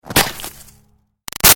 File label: Snow Impact Stab Gritty; Weather; BigRoomSound